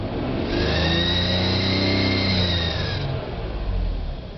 Whistling Under Hood crack or hole in coolant hose or bad gasket on radiator cap